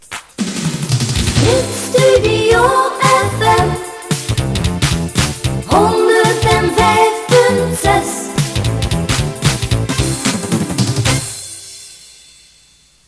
Gezongen jingle; Hitstudio

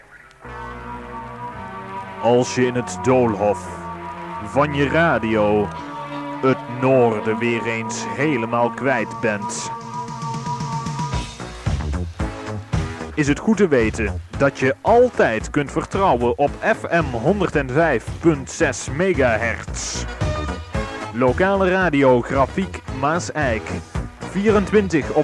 Jingle Altijd; Grafiek

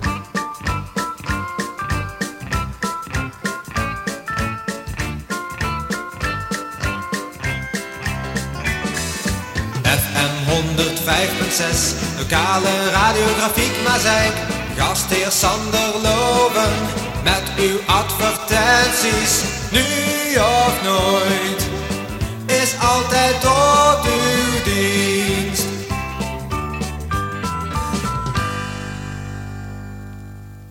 Jingle lang; Nu of Nooit

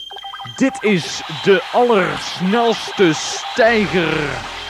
jingle allersnelste stijger

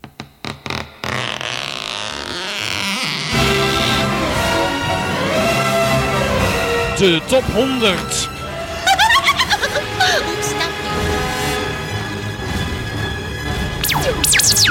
jingle top 100 spelletje; Hitparades

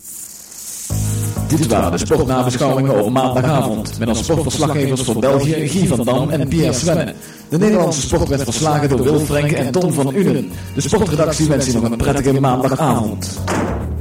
Jingle sportnabeschouwingen maandagavond

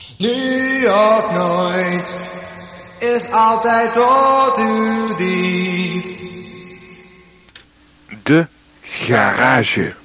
Jingle; Garage; Nu of Nooit

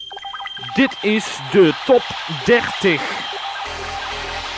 jingle top 30

